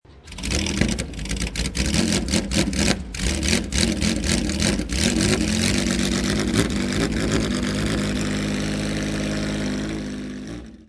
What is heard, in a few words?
Porsche 911 rev